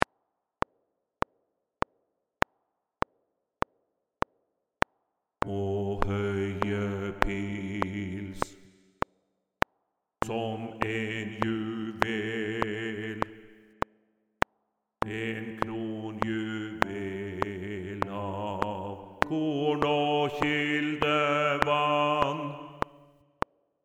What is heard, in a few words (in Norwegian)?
Bass